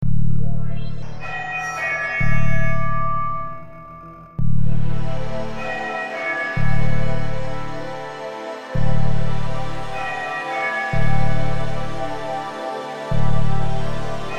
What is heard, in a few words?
cry